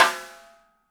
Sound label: DW Green Maple Timbali Snare; Sonic Foundry (Sony Creative Software); Vital Drums